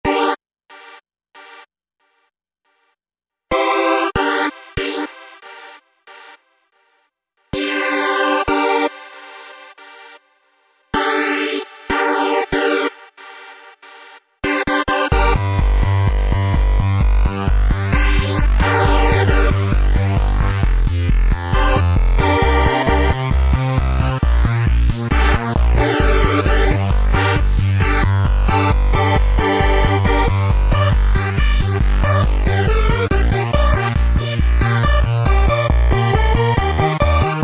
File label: Flange Floor, Roland V-Synth; Factory Patches